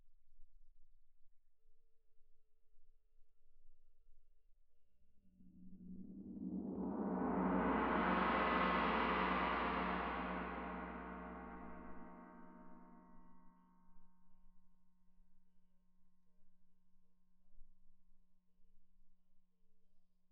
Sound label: Tam-Tam